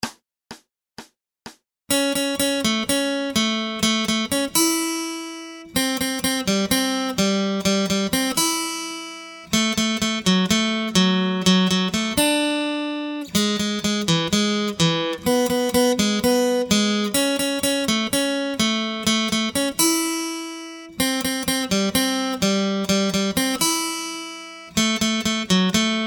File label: Acoustic Accompaniment, acoustic guitar; Grade 1